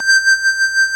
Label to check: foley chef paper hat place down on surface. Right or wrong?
Wrong